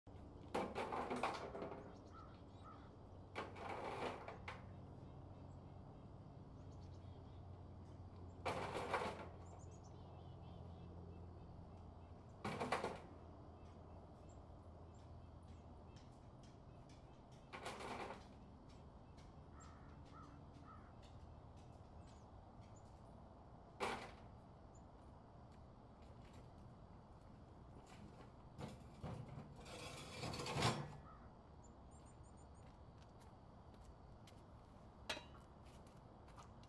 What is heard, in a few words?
wheelbarrow